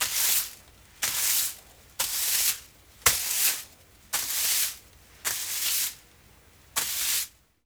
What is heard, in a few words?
WHISK, DUSTING; AKAI; 1000 Sound Effects